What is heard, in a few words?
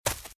Footsteps Leaves Sprint Left; RadwinIsland